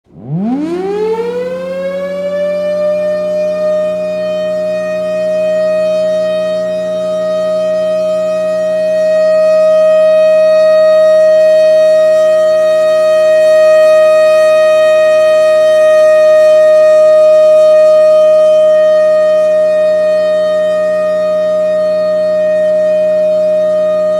alert, air raid sirens; brattleboro

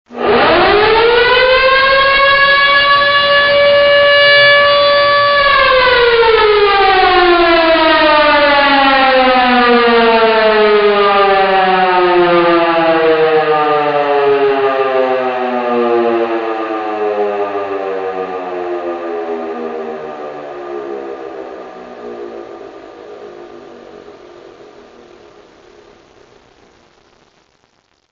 model 2 580, air raid sirens

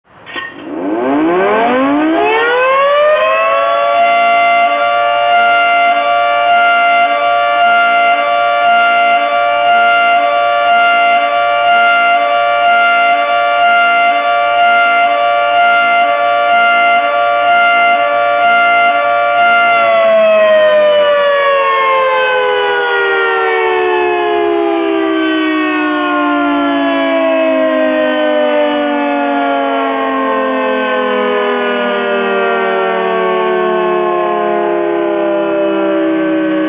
3T22 high low

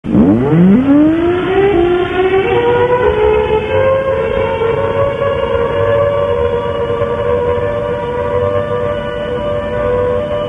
thunderbolt 1003 fire

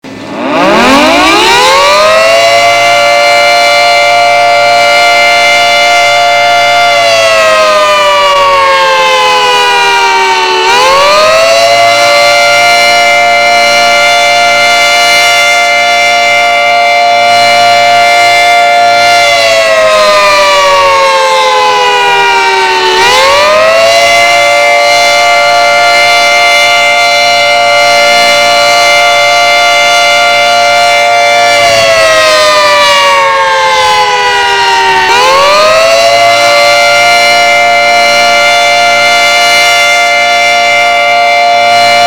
attack, sirens; Federal 2t22 Attack

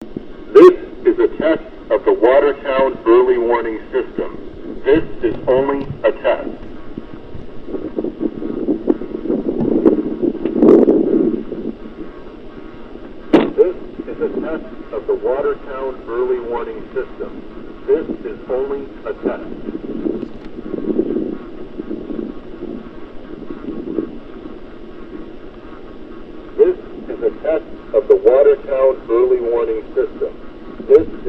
attack, sirens; EOWS * 612 Dual Tone Attack; Siren Contest / Entry

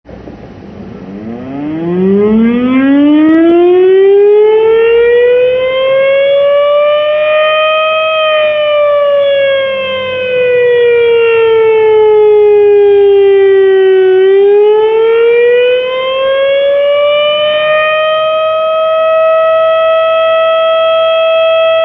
sth10 attack, air raid sirens

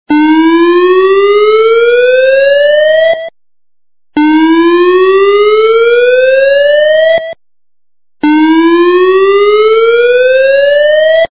whoop, sirens; Whelen; This is one of the new Whelen sirens Lewisville has placed into service.; Fire Station #4

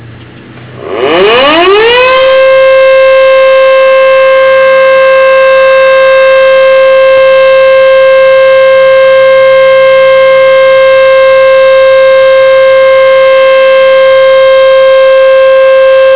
Screamer 2 HP, sirens; ACA Screamer 2hp in Alert

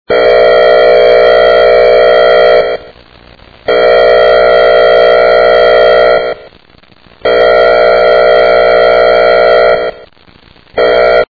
air horn, sirens; Whelen; This is one of the new Whelen sirens Lewisville has placed into service.; Fire Station #4

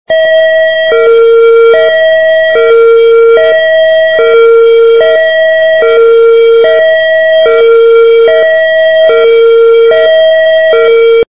hi low, sirens; Whelen; This is one of the new Whelen sirens Lewisville has placed into service.

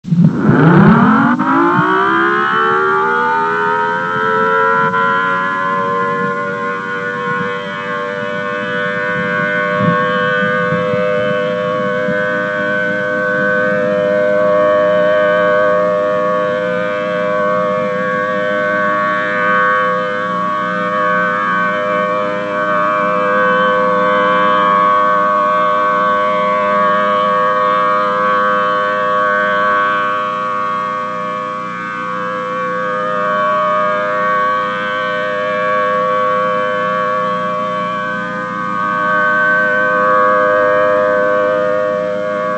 thunderbolt 1000t, air raid sirens

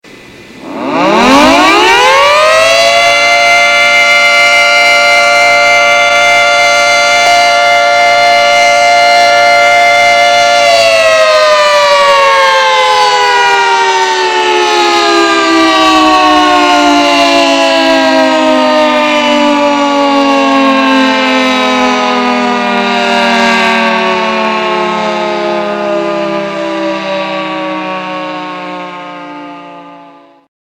2t22 noon test, sirens; Federal 2t22 Noon Test

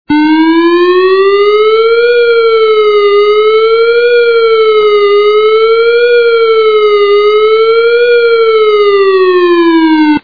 attack, sirens; Whelen; This is one of the new Whelen sirens Lewisville has placed into service.

